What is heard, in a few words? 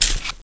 colt clip out, weapons